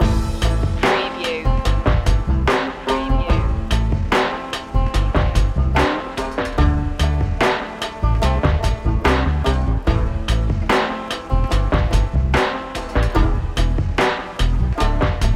banjo beat loop

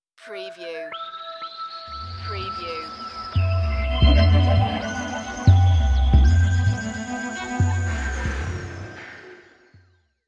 Scifi Audio Logo Ident, Mystery, Wonder; Twilight Zone

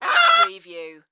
Chicken Cluck

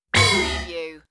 Alarm Hit

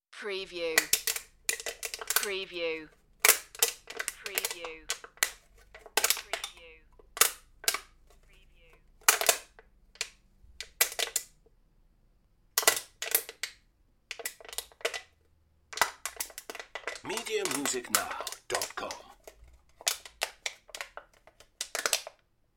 Aluminium Can Various Crunches

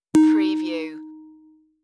Dong

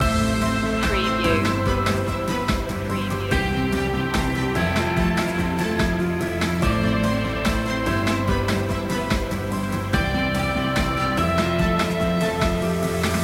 reaching a goal loop

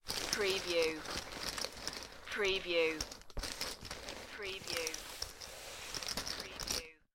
Poker Chips and Cards Moving on Felt

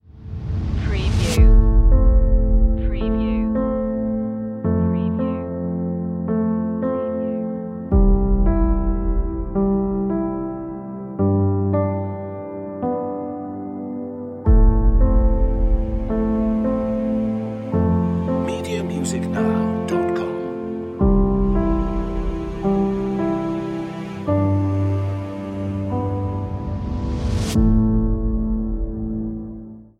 heartbeat inspiring cinematic orchestral

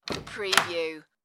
light room door open

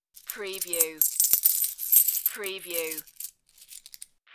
Coin Rattle

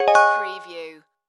Multimedia Chime

